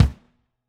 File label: Kick, drum